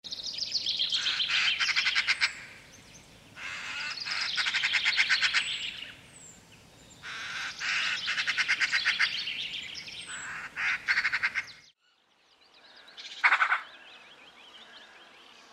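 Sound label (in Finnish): harakka, lintuäänet; Tavallisin ääni käheä naurahtava sarja; birdlife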